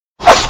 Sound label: sword